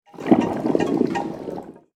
verse eau; residence MDQ

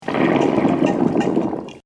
bouillonnement; residence MDQ

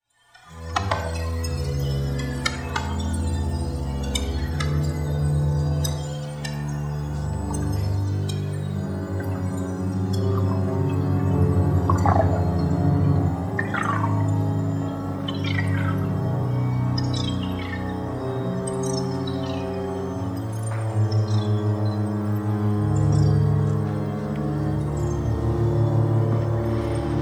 entree violon eau; residence MDQ

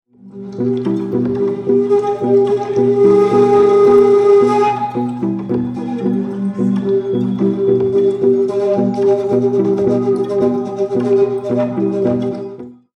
flute percu

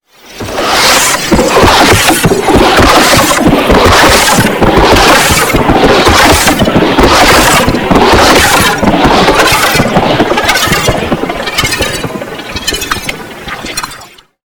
rythme d'eau; residence MDQ

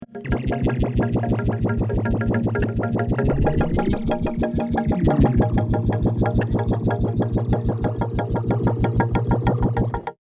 eau voix percu; residence MDQ

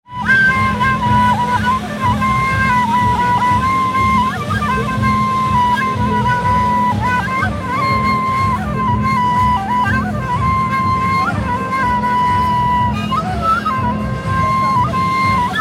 air trad flute, tunisie; flutes

